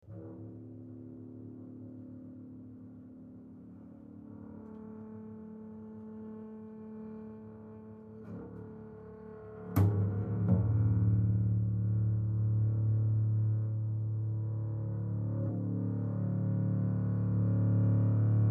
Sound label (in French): Contrebasse comtemporaine; louis-antoine lassonde